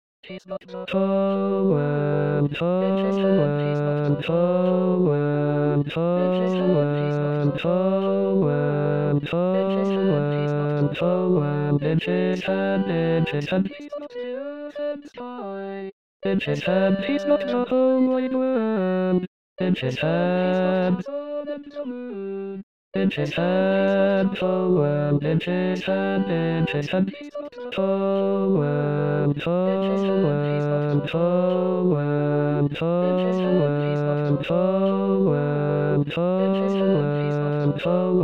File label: He s got the whole world Basses